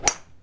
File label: Golf